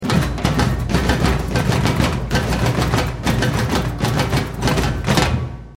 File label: up and down